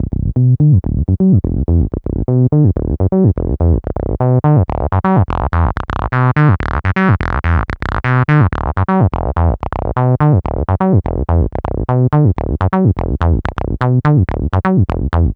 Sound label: bass loop, 125; Houseworx